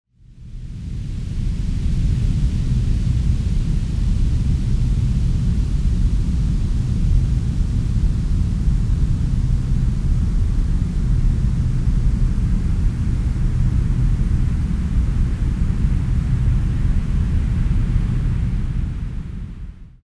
brownnoise binaural half hz bilateral hf noise; 0.5hz delta binaural bilateral sweep deep sleep

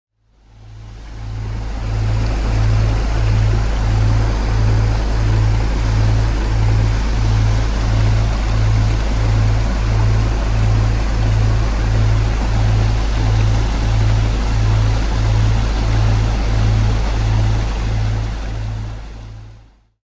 Binaural Beats Delta Wave Range and Water Sounds

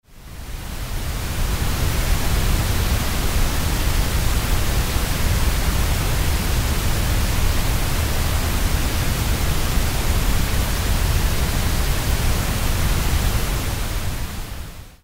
magenta noise

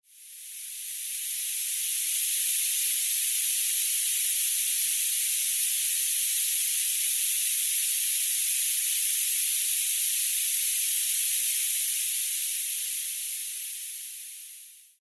12 kHz Noise Masking; Tinnitus Reducer

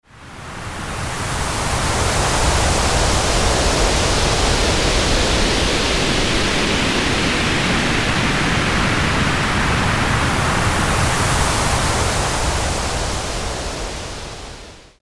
Noise Masking; Tinnitus; dynamic frequency; sleep; Tinnitus Dropper

